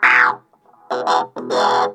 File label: WOW, Funk Guitar; 90 s Sample CDs; Zero G